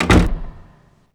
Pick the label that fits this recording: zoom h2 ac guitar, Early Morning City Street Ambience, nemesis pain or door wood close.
door wood close